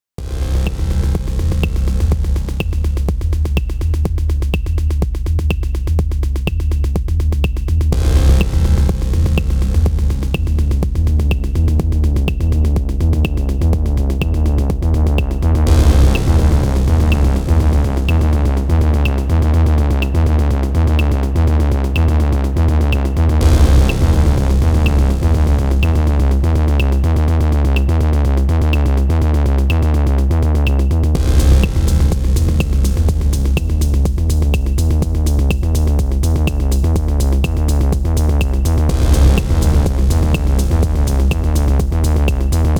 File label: Elektron Digitakt Techno